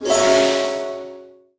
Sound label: spell ready